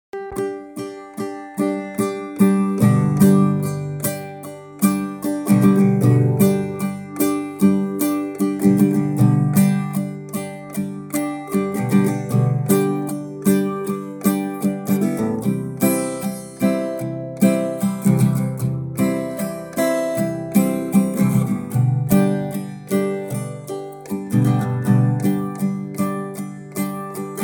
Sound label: guitar ringtone